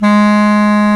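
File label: bass clarinet, Orchestral Winds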